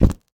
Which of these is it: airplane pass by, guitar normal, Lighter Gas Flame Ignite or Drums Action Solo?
Lighter Gas Flame Ignite